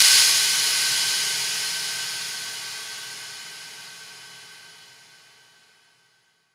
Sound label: Impacts